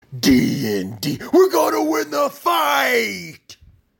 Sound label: Fight; DnD